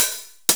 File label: Roland909